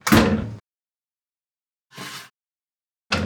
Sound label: open Oven; Singles Flirt Up Your Life